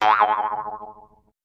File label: Jews Harp Vibrato, boings